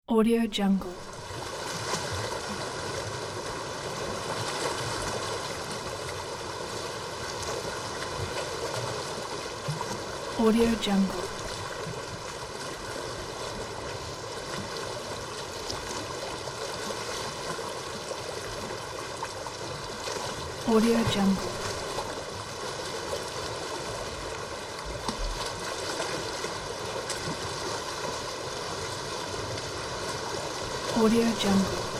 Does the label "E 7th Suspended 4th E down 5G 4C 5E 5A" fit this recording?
No